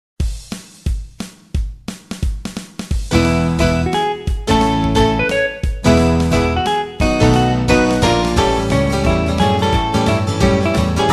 country tutti

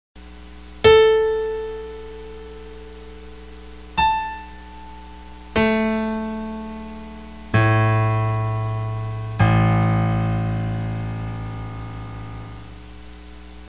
pitch series of As on piano

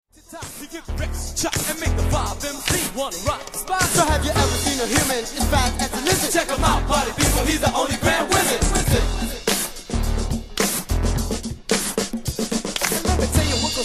Soul Clap

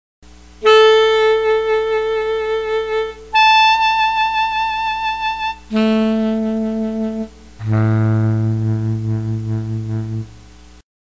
pitch series of As on saxophones

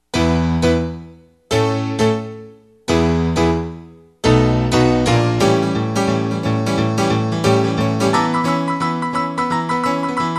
country piano

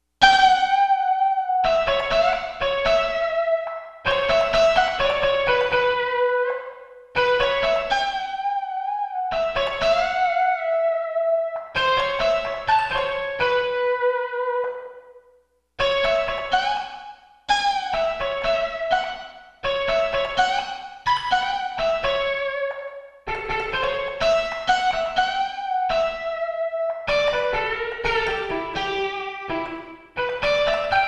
rock lead guitar

